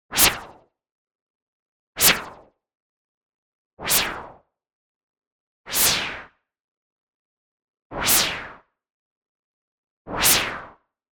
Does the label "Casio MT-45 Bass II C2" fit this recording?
No